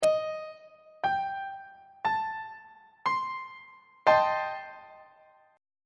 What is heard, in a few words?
g double sharp half diminished 7th 2nd inversion on treble clef